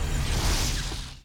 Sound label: Maximize; Lara Croft- Tomb Raider- The Cradle of Life